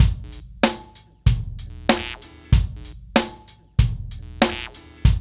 LOOP

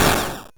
Drums, Hits; DCM8

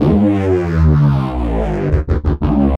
173 Bass, Drum n Bass; KJ Sawka; Drum n Bass & Beyond

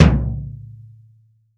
BD, REAL DRUMS, JUNGLE KIT

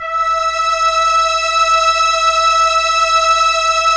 Strings Arp SE E6, ARP Ensemble; Keyboards of The 60's and 70's

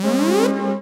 RAVE, RAVESYN

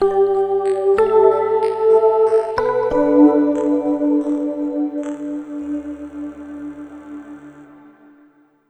Ambient Keys; Sonic Foundry (Sony Creative Software); Slow Silhouette

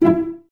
CES E Pizz, Cellos; Miroslav Vitous; String Ensembles

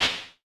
CLAP, Vermona Mono15 Drums

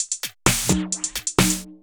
130 trance break, Drum & Perc Loops

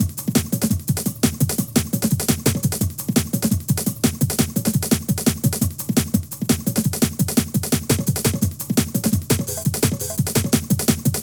Drum & Bass

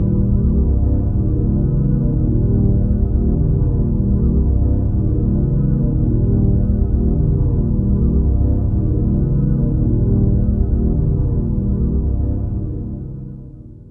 C1, Synth Line; 90 s Sample CDs; NiceBeats; SynthLine VOL-7

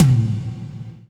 Low Tom, Percussion; Giga Samples Collection; ELQ-DrumQit

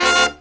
DBL HIT, Booty Brass; E-MU Formula 4000 Series Vol. 1; Hip Hop Nation

